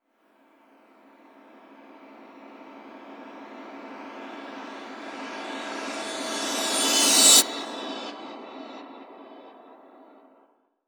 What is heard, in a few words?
FX Uplifter; Mutekki Media; Vengeance Electroshock Vol.2